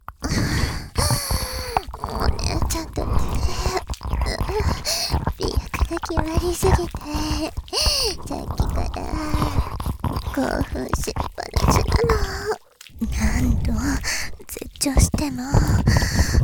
両耳ぐっぽり耳舐め手コキ; 媚薬ガンギマリれぇ; 手コキしちゃいま～しゅ

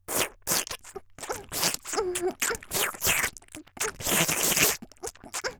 「フェラ」激しい5秒; 綾波系少女

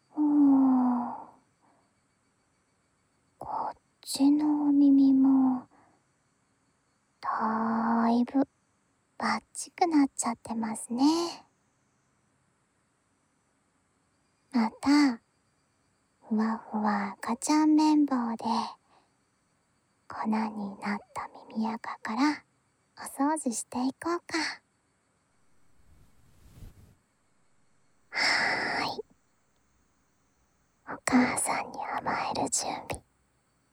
綿棒＆耳かき（右耳）,ASMR; 赤ちゃん綿棒＆耳かき（右耳）

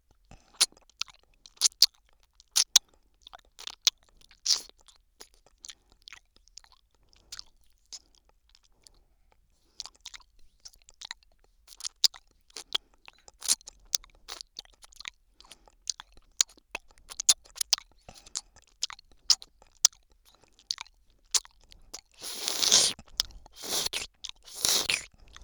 左耳アイス舐め

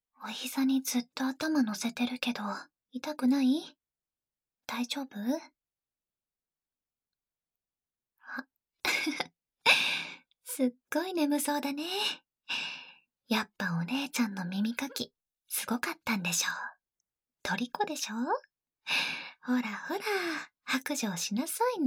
お耳ふーふーご奉仕(耳かきSEあり）, ASMR; 「お姉さん」

